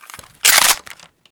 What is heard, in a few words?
saiga misfire, weapons